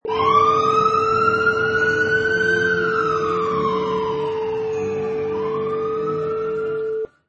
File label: Police Siren